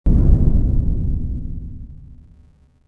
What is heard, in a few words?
dull hit